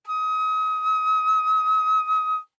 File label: Alto Flute vib ff Eb6 stereo; Woodwinds